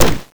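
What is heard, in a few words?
hit ground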